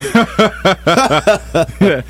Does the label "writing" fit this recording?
No